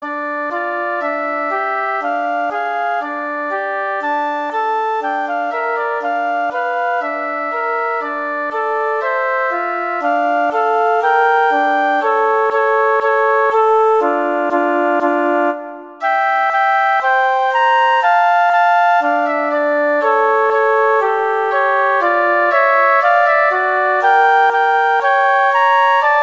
Sevivon sov sov sov Spinning Top Spin Spin Spin, Hanukkah 2 Flutes